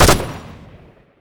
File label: klobb fire, weapons; gesource